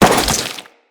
Crewman Step Flesh, Footsteps; Enemies; Corpus

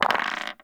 Dice Roll; Warframe; Koumei

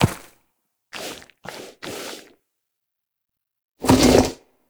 Ram Idle Lick; Warframe; Duviri

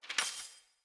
Bow Ranged Charged Shot Ready; Weapons; Envoy Hunter Bow

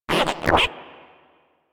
Mine Osprey Attack

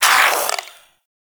Shadow Vocal Grunt; Warframe; Duviri

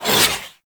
Warfan Whoosh; Warframe; Tenno; Broken Frame

